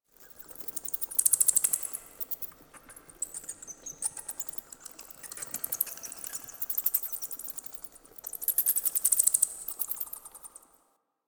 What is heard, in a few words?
Dungeon Island Cave Bats, Ambience; Warframe; Duviri